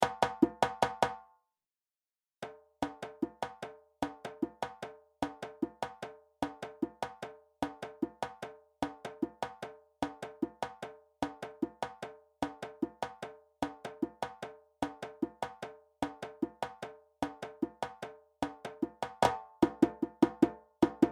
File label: Djembe